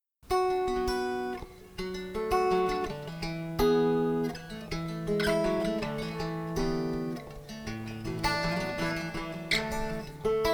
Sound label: fingerpicking